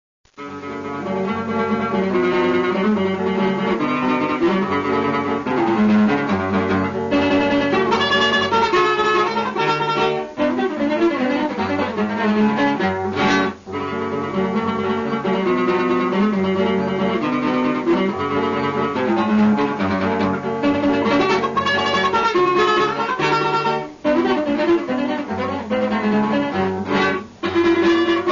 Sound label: hungarian dance